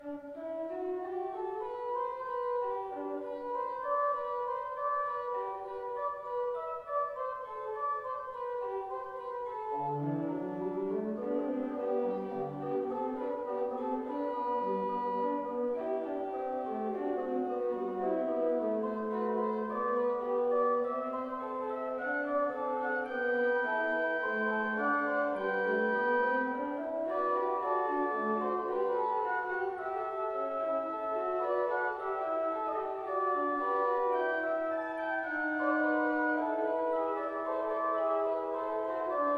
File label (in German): Improvisationen; Weihnachten2020; ev-kirche-rothenburg-ol